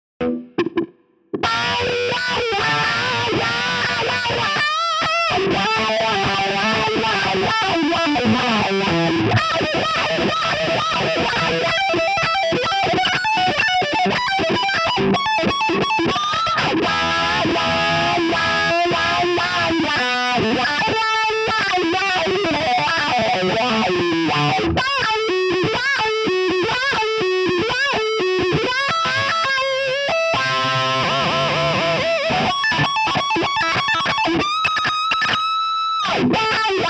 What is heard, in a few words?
wah